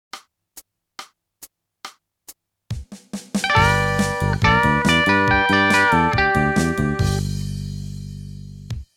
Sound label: country